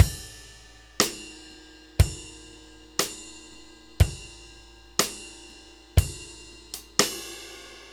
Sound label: New York Loop, Drum Tools, Laidback